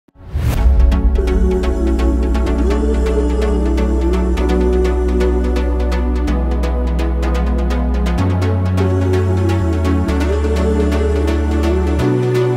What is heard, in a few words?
bigroom remix